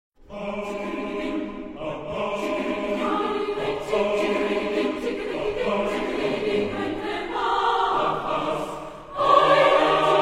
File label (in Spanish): Coro de Voces; Coro de Voces para la Paz; Ay del chiquirritin